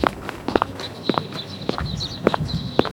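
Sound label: footsteps on pavement muffled; school bell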